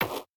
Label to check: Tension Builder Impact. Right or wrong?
Wrong